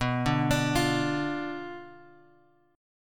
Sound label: B Diminished B, guitar; Bdim Chord {X 2 0 X 3 1} arpeggiated